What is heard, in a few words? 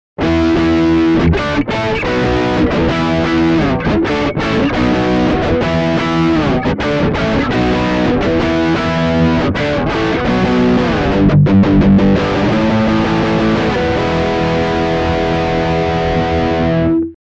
Neck Drive, sabotage